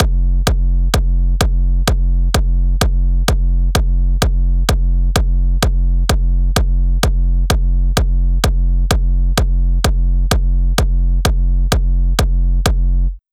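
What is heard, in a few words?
Drum Loop bass drum F, 128BPM; VEC5 Drumloops; VEC5 Multi Loops 128BPM; Loop Elements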